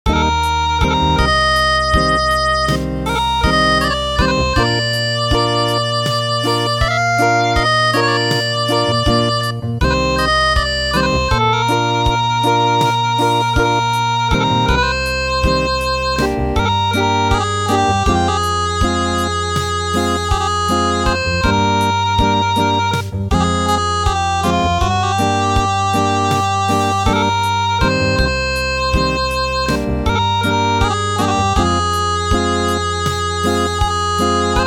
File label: Acoustic